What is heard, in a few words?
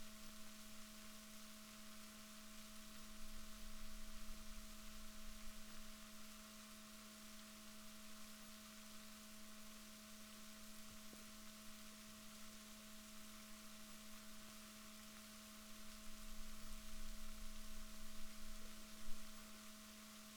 rorquals negatif, Balaenoptera Physalus Chile